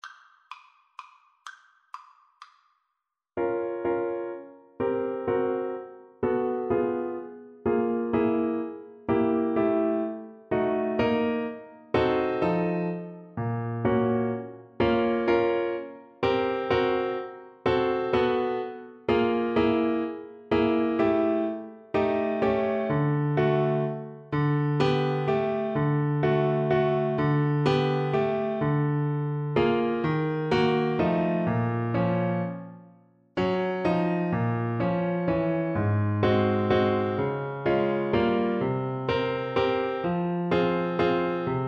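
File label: mazurka alto sax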